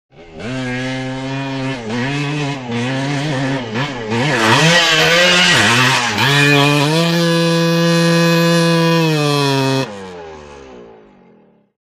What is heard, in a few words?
Cut Engine Scramble, trials bike